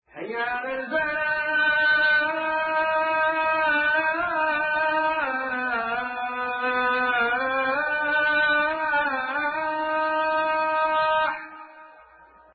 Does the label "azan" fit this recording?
Yes